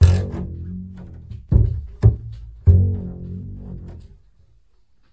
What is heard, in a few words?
flabby bass wobble pop pop